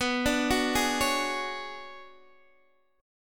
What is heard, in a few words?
B Minor 6th Add 9th B, Guitar Open D